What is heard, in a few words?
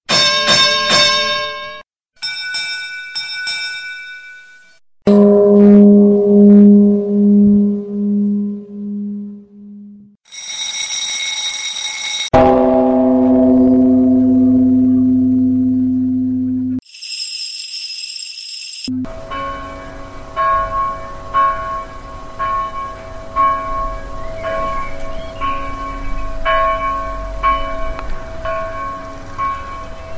Bell collage